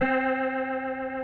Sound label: GUITAR FX